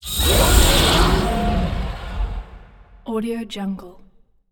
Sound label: insect creature scream chitter giant insect